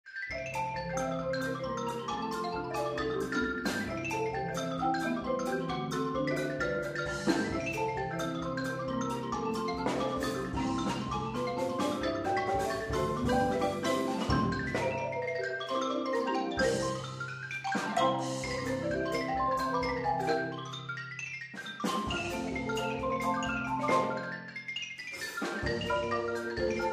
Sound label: Triplets